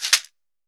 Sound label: CABASA; AKAI S-Series CD-ROM Sound Library VOL-5